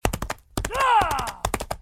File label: attack; Blind Gladiator; Torquatos